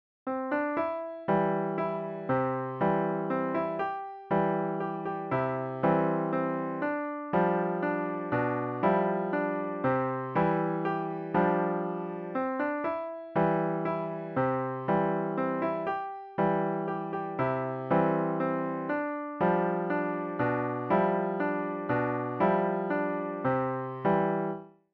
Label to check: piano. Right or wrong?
Right